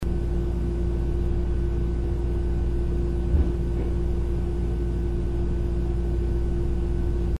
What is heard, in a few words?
poss footsteps; In the background you can hear what sounds like footsteps.